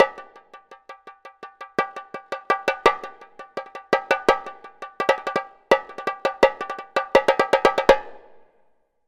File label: Shimedaiko